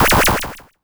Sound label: Cwejman S1; Matter Sound; DevSnd Cwejman S1 - RD